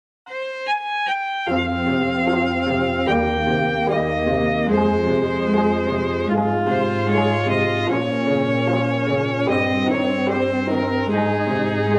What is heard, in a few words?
Backing Track